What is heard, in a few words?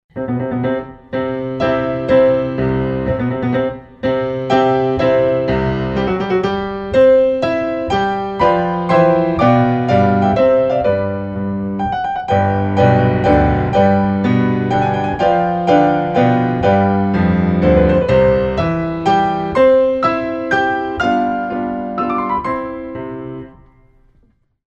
Minuet in C; Beethoven